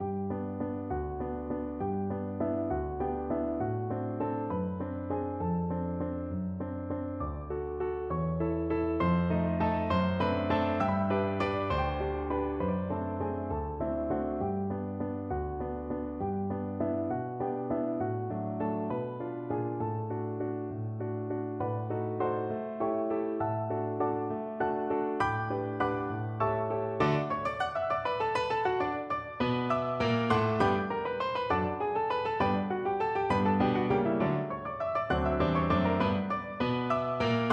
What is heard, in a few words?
tchaikovsky waltz piano duet